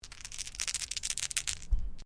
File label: Dice Small Shake Hands; Variety of dice sounds; Casinos